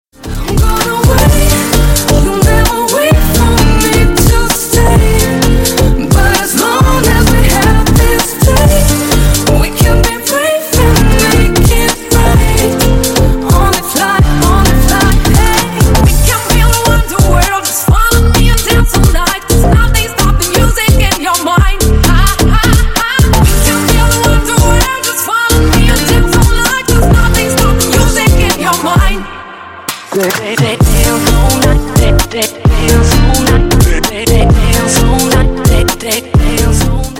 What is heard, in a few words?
bass boosted remix